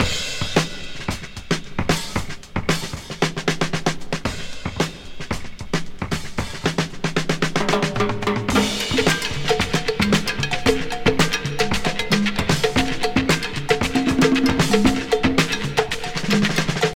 113 bpm breakbeat sample g key, breakbeat sample - kick tuned to the G note; drum loop